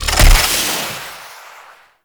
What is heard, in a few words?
Mech Jump Slam Start; Vehicles; Abilities; Warframe; Nechro Mech